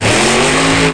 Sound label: turbo